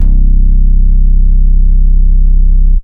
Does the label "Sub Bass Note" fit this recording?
Yes